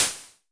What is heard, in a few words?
SN Basic, Vermona Mono15 Drums Snare